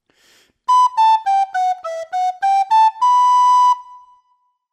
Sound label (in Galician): picado, Pito Galego; articulacion